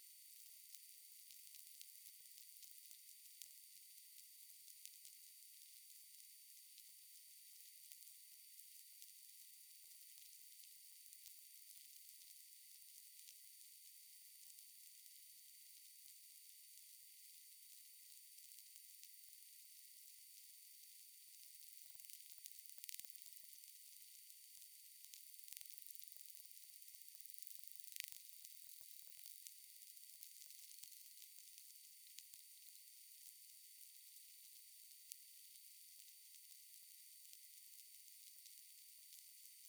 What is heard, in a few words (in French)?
Dauphin clic; clic2